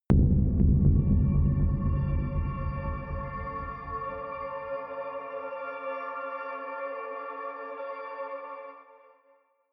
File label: horror shimmer, drones